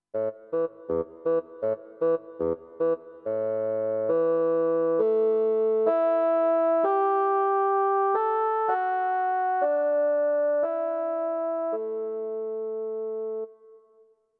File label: Bassoon